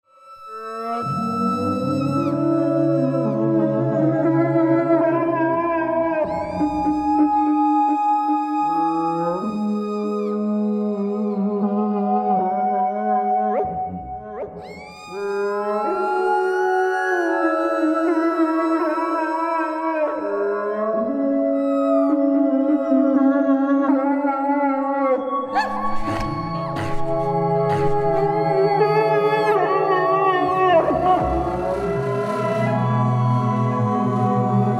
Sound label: Electronic